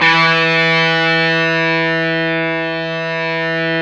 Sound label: GTR, Dirty Buzz; Club-50 - Foundations Roland